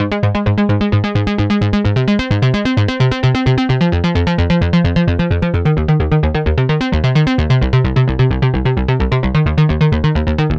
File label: synth bass dry no airspace; airspace hybrid reverb and delay; modeaudio